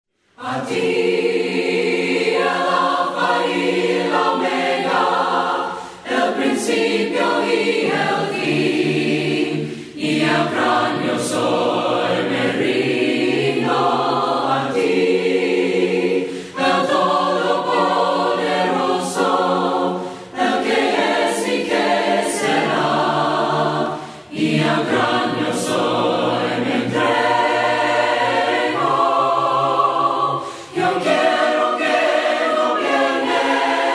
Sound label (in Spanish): VOCES, himnos a capella; cantos del camino; A TI EL ALFA Y LA OMEGA